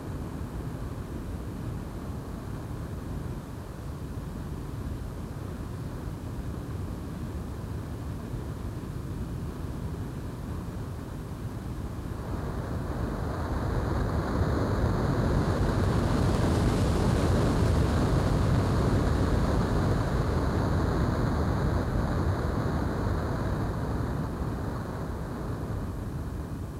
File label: ambient